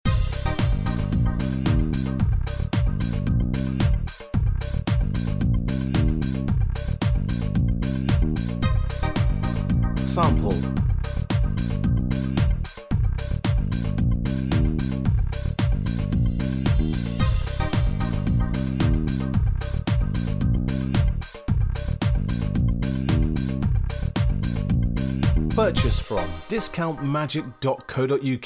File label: corporate